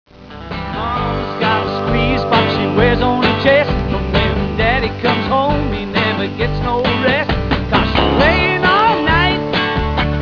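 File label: squeeze box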